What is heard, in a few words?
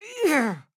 AHurt